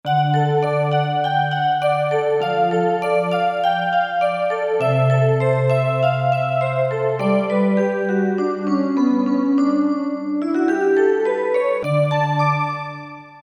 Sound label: Bell Wave, Korg MSC-2S Synth; Synth 1